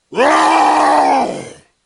intro growl; superheros; the hulk

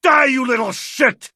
die

